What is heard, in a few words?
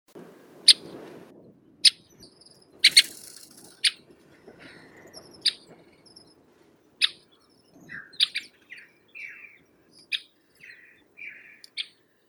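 Siberian rosy finch, Leucosticte arctoa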